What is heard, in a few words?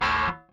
Rickenbacker Bass; Airwindows; Rick-Xtras